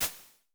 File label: Shakers, Vermona Mono15 Drums